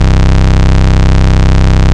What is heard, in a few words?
Ultimate Bass Root C; Vengeance Samples